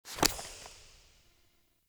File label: UI Quest Journal Close